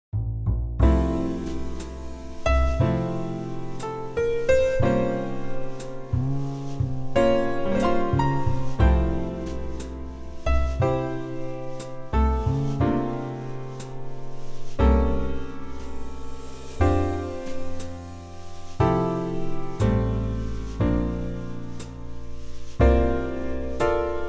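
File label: F, backing track; key - F - vocal range - F to F; Great Trio version of this old country hit. Really slow and intimate!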